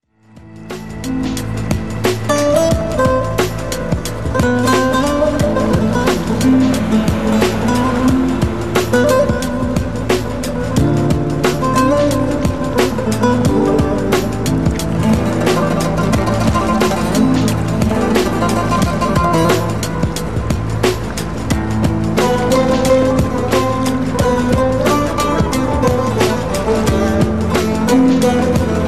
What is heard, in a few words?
New Message Tone